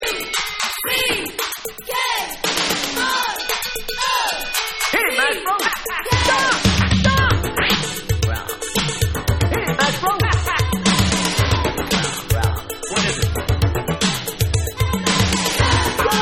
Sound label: DUCK ROCK CHEER Vocal